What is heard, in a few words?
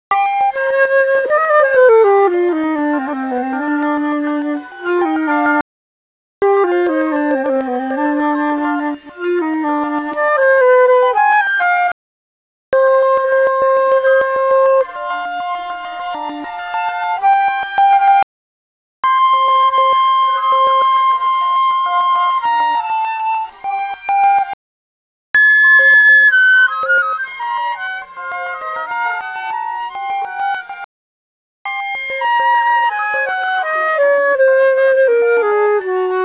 Six Flute Melodies